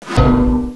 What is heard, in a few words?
pipe hit; weapons